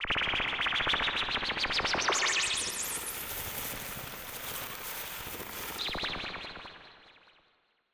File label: Birdie riser